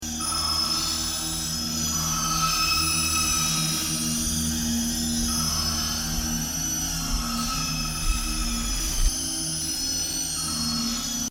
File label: Alarm